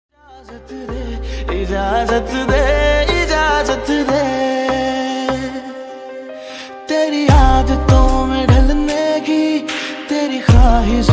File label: hindi tik tok song ringtone